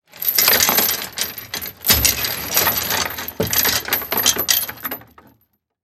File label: Transportation Chain Rattle Pull Anchor On Ship